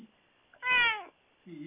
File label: cat meow